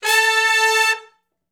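Hi Medium Short Note (A) unison, Horncraft for R&B Sections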